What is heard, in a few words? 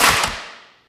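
physics clipboard impact large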